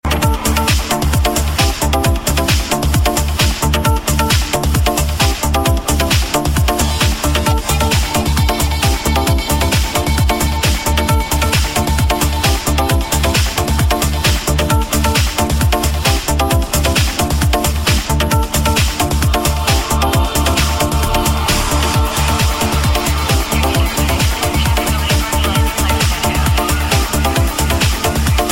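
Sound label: Soul Trance Ringtone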